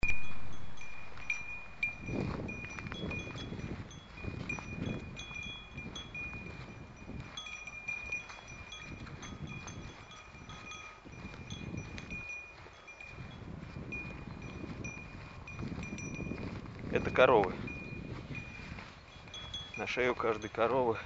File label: cowbells